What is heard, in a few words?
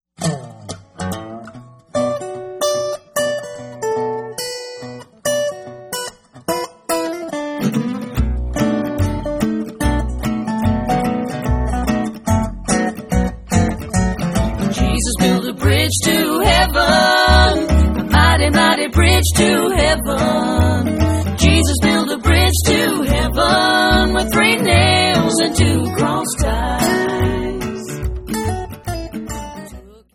bluegrass